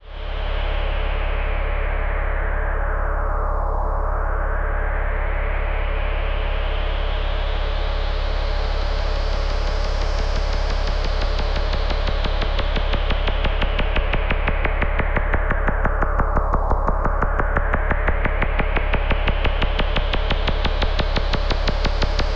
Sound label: Loops And Drones